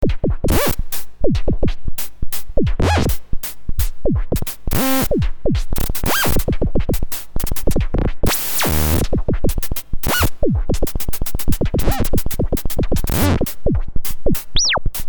EMS abstract Marsch

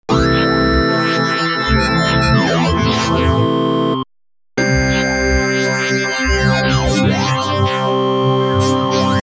wavetables, synthesizer basics

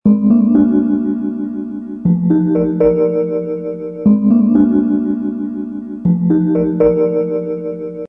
warm K5000; kawai

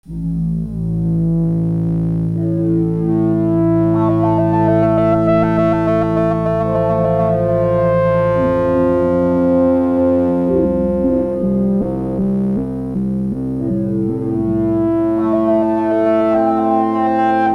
fm sbox, oberheim xpander

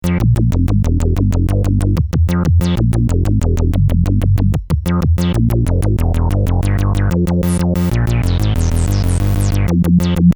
filterdigi1, waldorf q plus

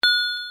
multi, vermona drm1

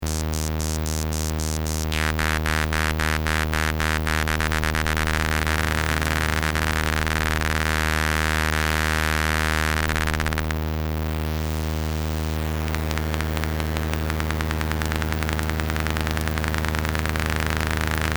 LFO pw filter; hoel LFO

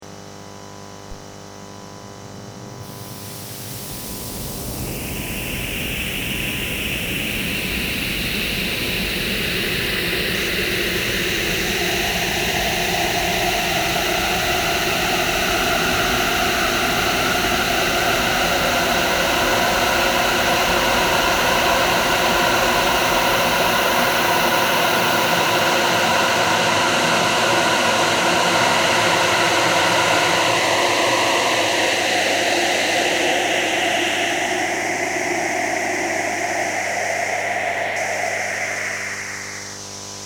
filterbank moog; arturia

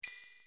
hh, vermona drm1

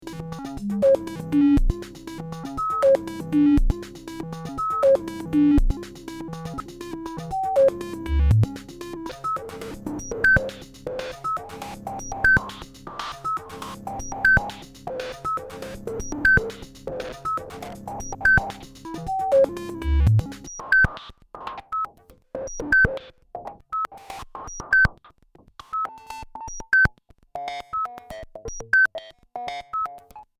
filter, jomox

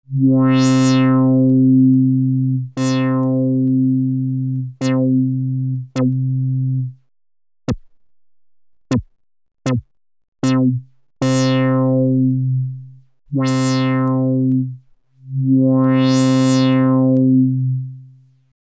env filter, synthesizer basics

